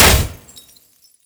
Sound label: bullet damage mech